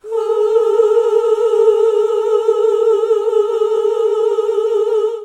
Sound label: OOH A3, GOSPEL; Best Service; Hallelujah; GOSPEL 10